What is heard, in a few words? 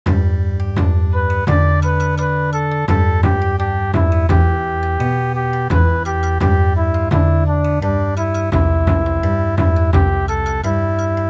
Bon Odori, midi